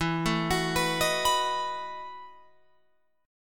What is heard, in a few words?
E Major 7th Suspended 2nd Suspended 4th, Guitar, Open F; EM7sus2sus4 arpeggiated